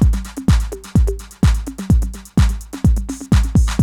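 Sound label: NO SNARE LOOP, BREAKBEATS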